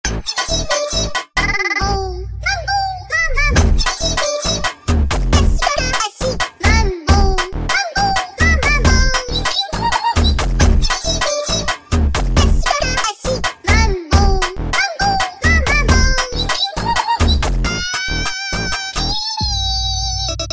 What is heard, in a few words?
Funk super Sped Up